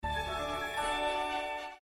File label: Chime; Star Trek 2